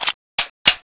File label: kingcobra clip in